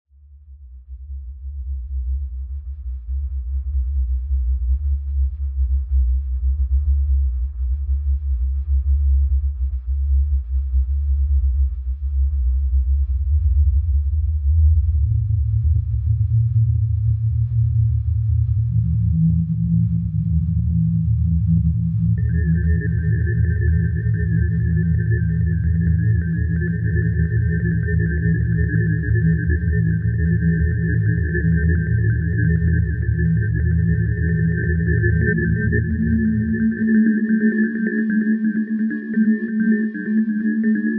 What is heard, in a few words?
Ambience